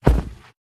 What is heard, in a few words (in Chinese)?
北极熊脚步声